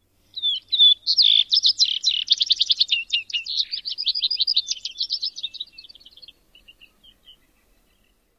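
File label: vesper sparrow flight song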